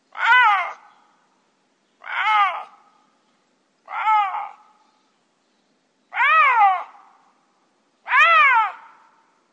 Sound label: lynx